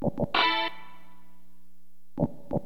SKANK, HipHop; LiveAct Pool

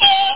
EEEK

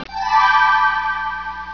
Default Beep; Joyful; Christian Desktops for Win98

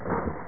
clank

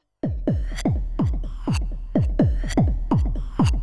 XTRA HOUSE 125

